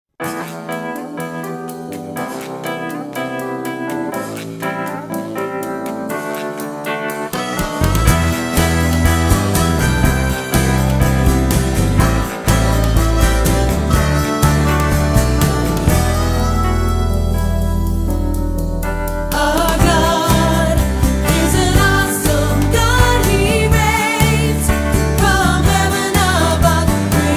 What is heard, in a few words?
acoustic worship